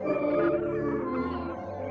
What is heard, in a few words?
SYNTH PAD ETHNO 125 A, Sphere